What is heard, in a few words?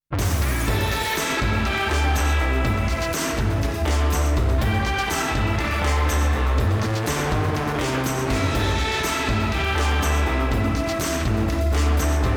Instrumental; Ride 3